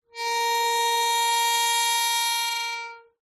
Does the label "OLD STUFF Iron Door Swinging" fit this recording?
Yes